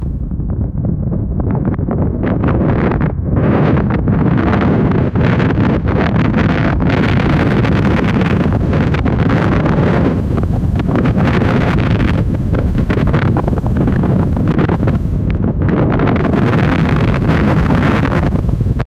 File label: mountain wind recored on; realism